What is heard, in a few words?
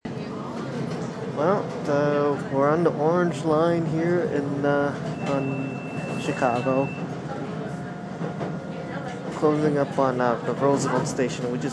orange line to loop